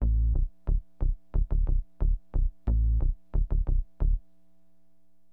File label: BASS, HipHop; LiveAct Pool 1